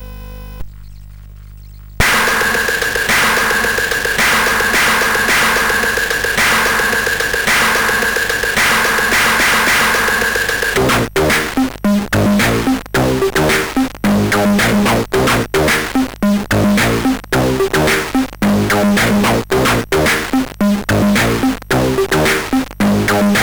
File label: Boogie, CSG8580R5